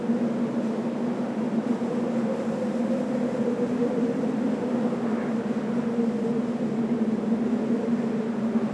vent falaise

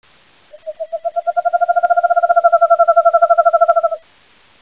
chouette

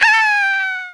chute; worms

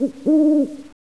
chouette

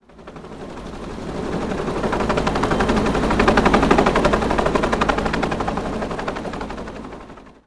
helico

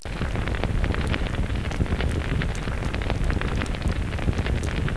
feu

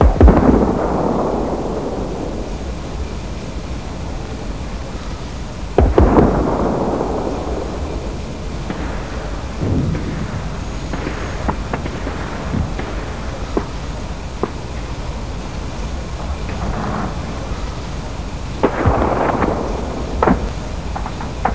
artillerie loin; de nazca v3

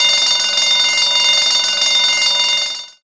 sonnerie; de rats theatre

